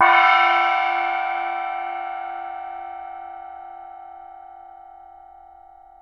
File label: TIBETAN, World Combo